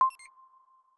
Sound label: REC Start; samsung YP-U5; Audio User Interface